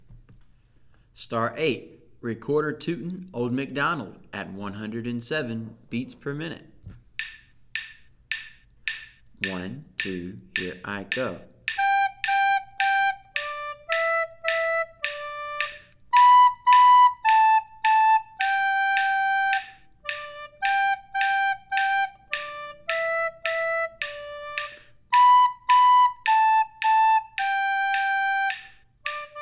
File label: 107 bpm; Recorder Tootin' Old MacDonald; Be A Recorder Star Songs